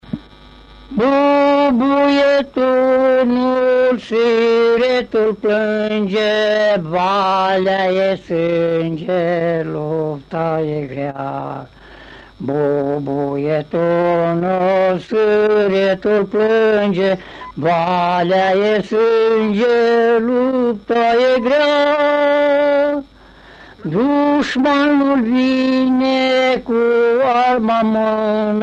Bubuie tunul Siretul plange; Fonoteca Arhivei de Folclor Vasile Adascalitei - CJCPCT Vaslui

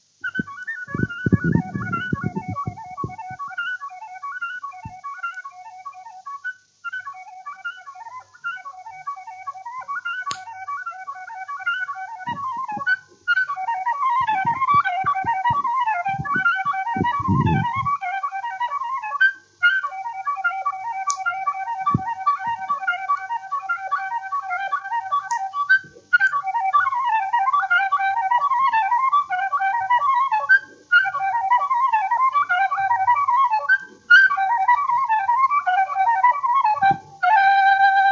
Batuta trisca; Vaslui

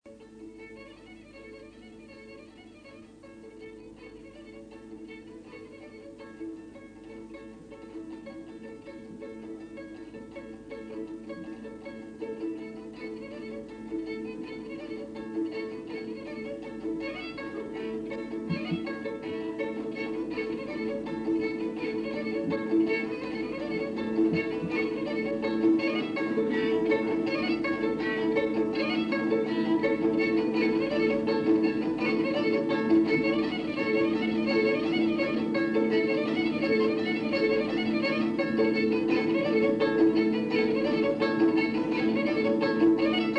Ceasul la cobza si vioara; jocuri si cantece batranesti; Vaslui

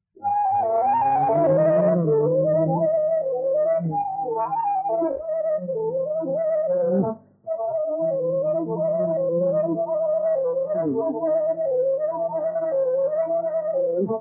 Hora batraneasca; Vaslui

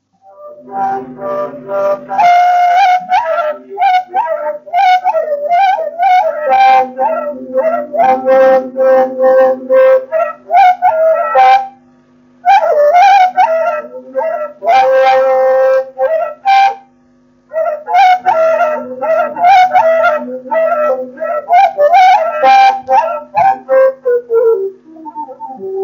Doina fluier mare; Vaslui

